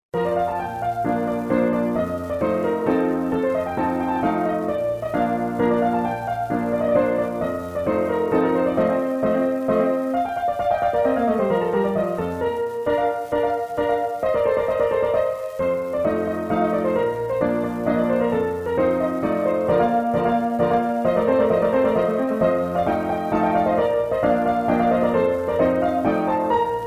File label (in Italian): walzer pianola